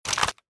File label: draw heavy, weapons